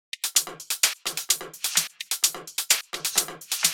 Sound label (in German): Drum Loop 128BPM ohne perc; VEC5 Drumloops; VEC5 Multi Loops 128BPM